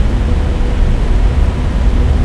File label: compacter; ambience; narshaddaa